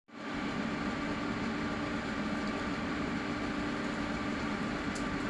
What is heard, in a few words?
extreme load